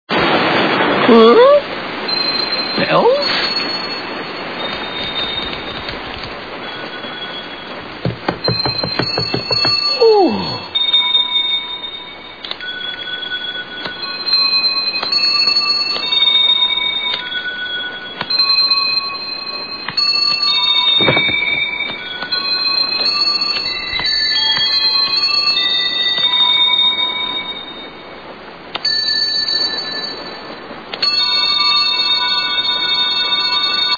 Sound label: bells; hunchback